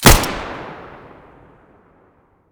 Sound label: muzzle fiveseven mono, weapons